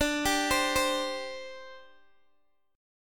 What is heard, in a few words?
D 7th Suspended 4th D Guitar Open G